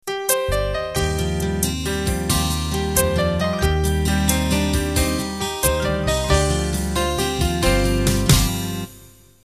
12/8 Ballad Intro, triplet based ballads, MIDI; #12bld 2.sty compatible MIDI file Intros and Endings

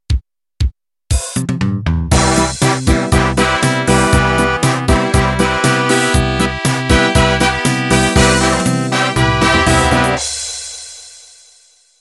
Pop Polka Intro

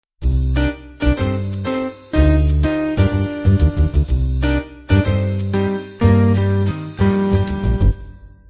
jazz quintet intro

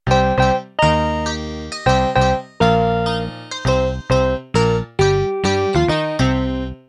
Country Shuffle End

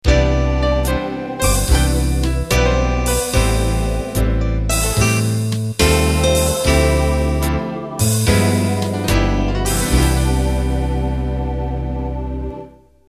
Slow Jazz End

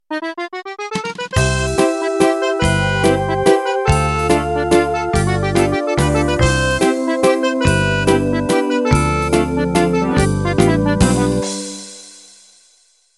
Musette Intro

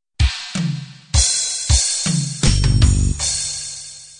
guitar ballad intro

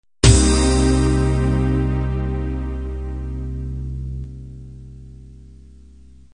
8bt analog end

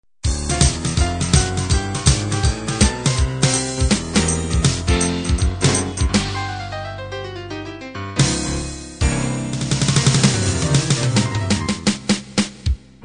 boogie woogie end

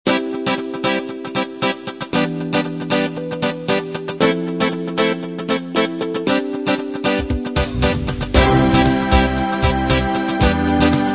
pop ballad intro

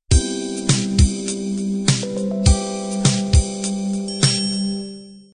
color beat intro

